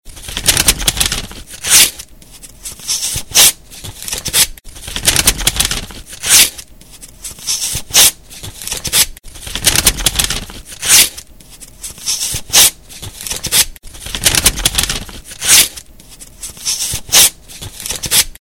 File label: Papers